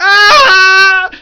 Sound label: Civilians aahhh; 1997 MMX LAN PACK (win 95); Army Men